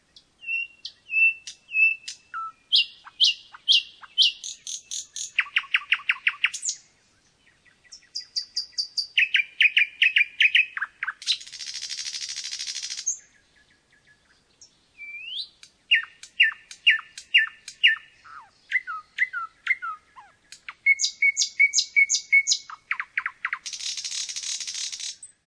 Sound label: luscinia; EST